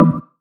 Synth Cuts; Vengeance Samples; VES1 Instruments

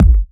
Bassdrum; Vengeance Samples; VEE Bassdrums

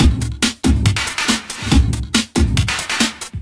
Breakbeat 140 BPM; Vengeance Samples; VEC3 Loops 140 BPM

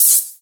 Cymbals HH Open; Vengeance Samples

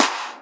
Clap; Vengeance Samples; VEC1 Claps

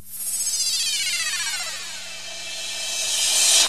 Cymbals Reverse Crash; Vengeance Samples

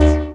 Synth Sound; Vengeance Samples; VEE Synth Shots

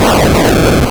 Arcade Riffs

